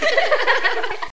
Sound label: giggle; BBC